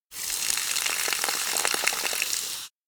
hose spray; anemoi

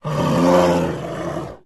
Bear Intimidation; anemoi

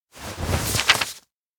paper pass; awayuki; anemoi

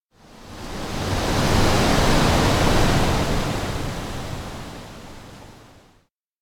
kite wind; anemoi

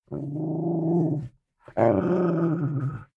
dog garururu; anemoi

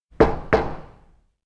knock janitor room; anemoi

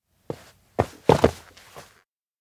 steps outdoor observatory land; anemoi

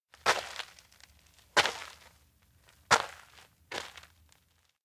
steps outdoor wheatfield walk; anemoi

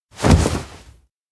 get up; chiharu; anemoi

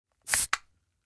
can open; anemoi

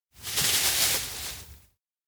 ermine approach; anemoi

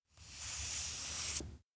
album stroke soft; anemoi

